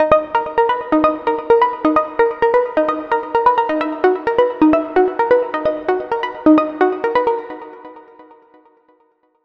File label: Pluck